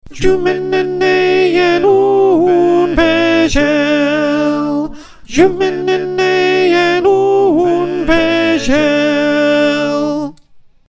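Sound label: Soprano, choir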